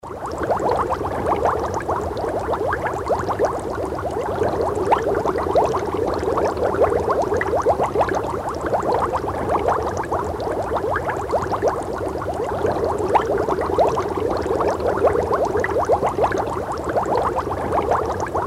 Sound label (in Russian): zvuk bulkanja